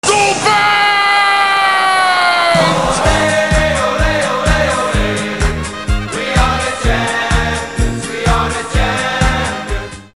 Doelpunt & Olee Olee

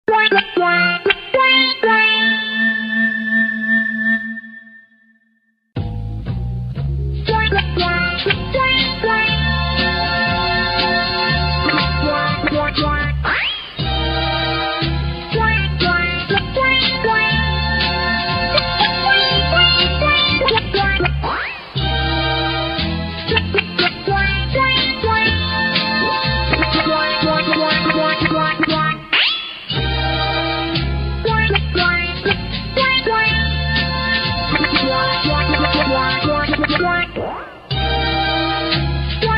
Jingles Instrumentaal; Radio Veronica

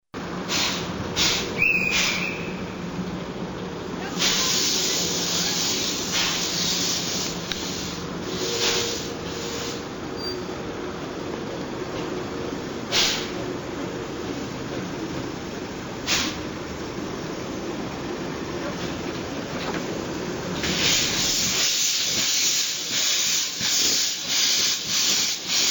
Trein Vertrekt, Treinbaan Geluids Effecten

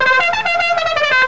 Mexicaan Trompet, Kermis Jingles; Kermis Jingles Diversen

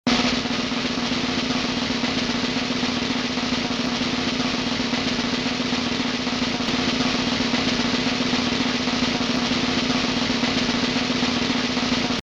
Trommel; Sound Effects Deel 2

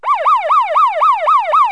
Sirene; Sound Effects Deel 1

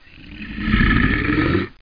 grom; Spookslot Tunes Effecten

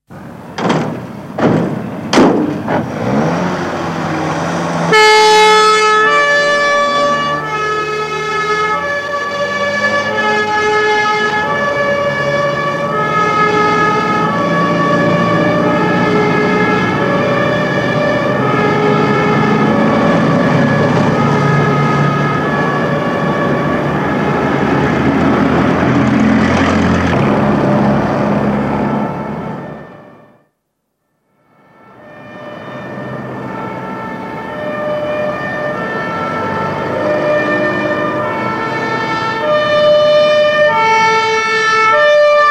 Politie Sirene; Sound Effects Deel 1

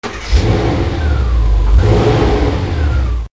Auto Motor

